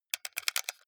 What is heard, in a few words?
console controller xbox play short fast